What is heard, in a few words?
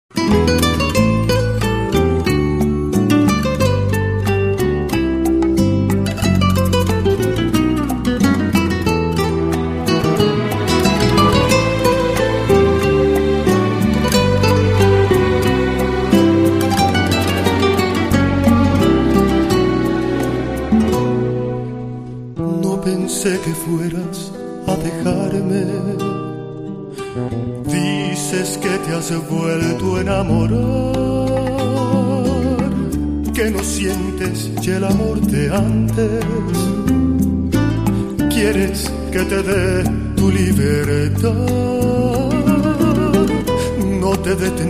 rumb